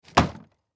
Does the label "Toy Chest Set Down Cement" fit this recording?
Yes